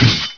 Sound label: SWORD; Exile III v1.0